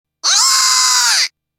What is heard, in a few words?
wryyy